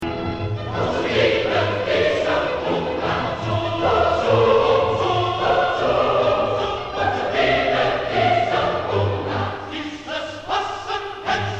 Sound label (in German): choral; Bach, Johann Sebastian; Matthäus Passion; wozu dienet dieser unrat